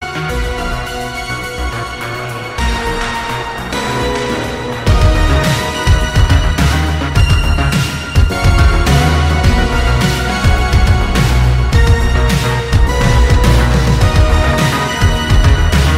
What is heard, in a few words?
dance; Resident evil